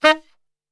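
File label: D# saxophone shot, Brass Super Section; Best Service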